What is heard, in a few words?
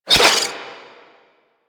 Rising Blades Whoosh; Warframe; Warframes; Pax Duviricus